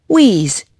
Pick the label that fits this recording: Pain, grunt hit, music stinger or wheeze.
wheeze